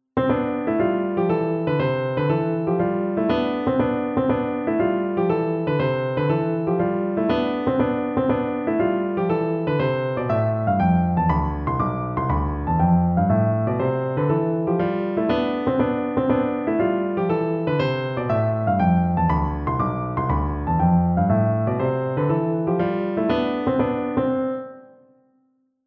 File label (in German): Tonleitern Spielen C Dur, Klavier